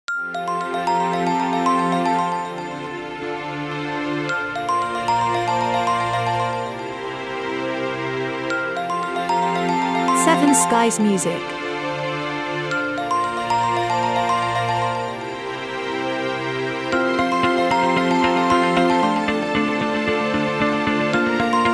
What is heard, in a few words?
Corporate Inspiring